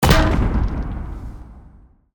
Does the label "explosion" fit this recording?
Yes